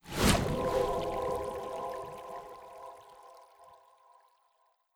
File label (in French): Fontaine